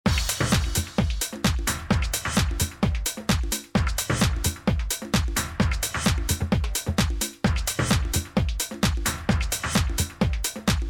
remix 130 120 bpm

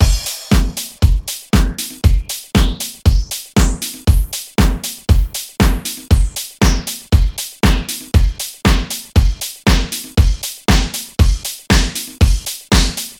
bpm 118

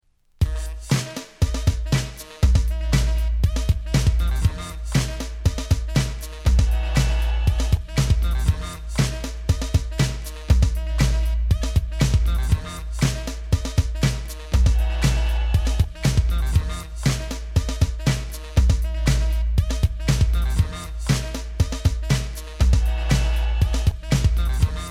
beat

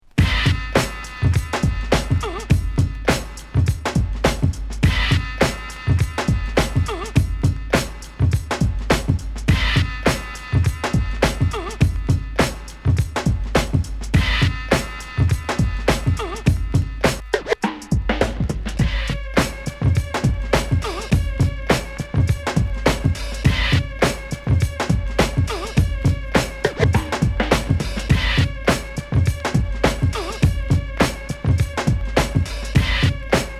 beat, bpm 103; wicked mix vol 024

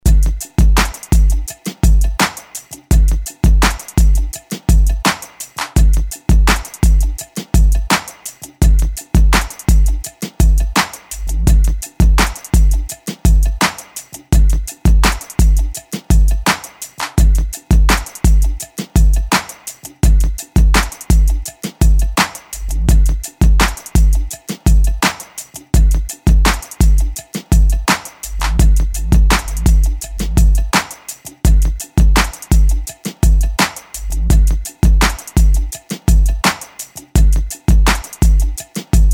beats bpm 84

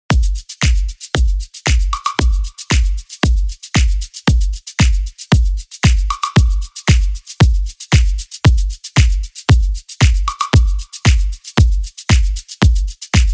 bpm 115

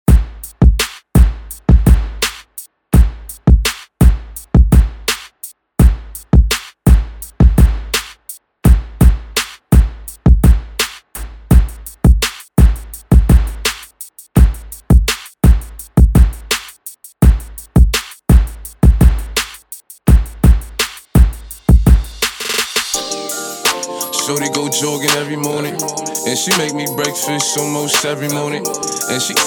bpm 84

